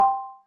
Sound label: click ok